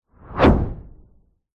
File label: science fiction spacecraft hyper sonic pass by zoom whizz; zapsplat